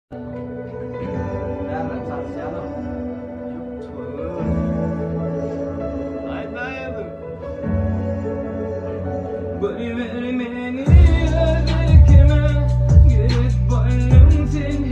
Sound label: turkmen rap